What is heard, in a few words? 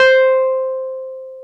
PNO, Wurly; Roland LCDP10 Keys of the 60s and 70s 2; Wurly 200